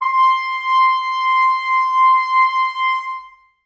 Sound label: brass acoustic, muted trumpet